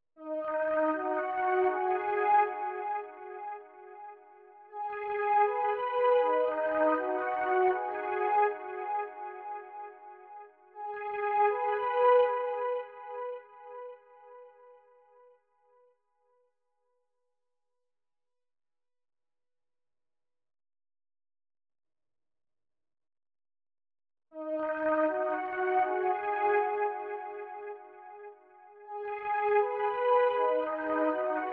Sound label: 159 BPM D# Min Pad; Melody; Loop Stems; Cymatics; 2022 Melody Collection Platinum Expansion; Sky Blue